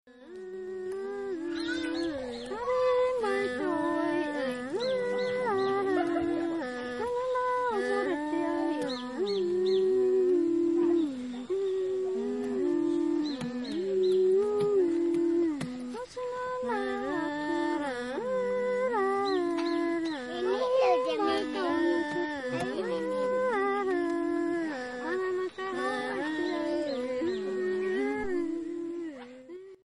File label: Are-Are Lullabye; Sounds Of Bamboo